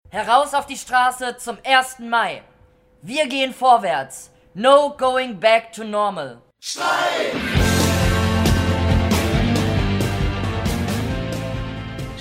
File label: jingle; erstermai